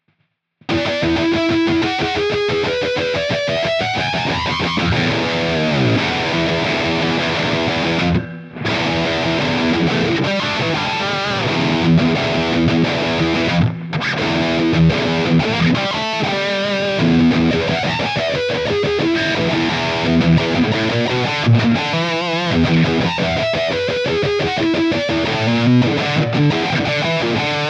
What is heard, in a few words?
Tight and Chunky